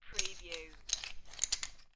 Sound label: Poker Chips Handling and Moving Chips